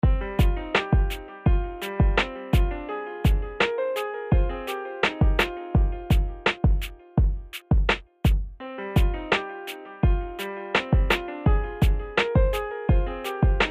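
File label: backing track piano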